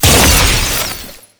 Explosive Impact; Warframe; Weapons; Acceltra Prime

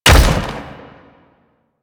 Step; Warframe; Sentients; Erra; Foley

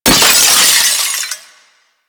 Generic Glass Break; Warframe

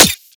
Rifle Mech; Weapons; Warframe; Corpus; Crp Ruban Rifle

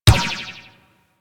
Ball Bounce Default; Missions; Tenno Ball

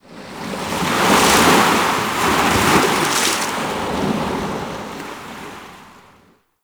Ocean Wave Crash; Warframe; Grineer Ocean

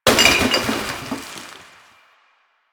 Garbage Bag Destroy

